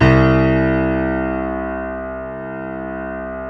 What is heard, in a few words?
JAZZ C1, Keyboards Acoustic; AKAI; USB Soundscan vol.17